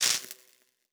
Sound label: Spark, Electric